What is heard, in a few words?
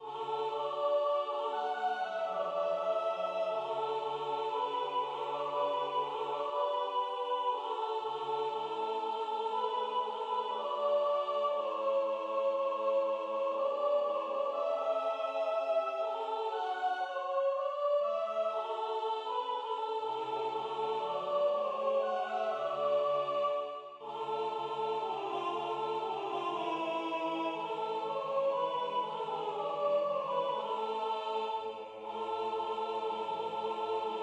Sopran; Im Wald (Mendelssohn)